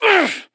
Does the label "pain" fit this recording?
Yes